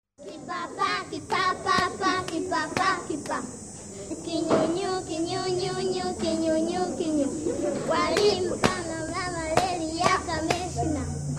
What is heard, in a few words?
Hand-Clipping Game Song, Music of Tanzania; Kipapaki Papapa